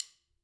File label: stick click; apulSoft apTrigga2; Multi-Velocity Cymbal Samples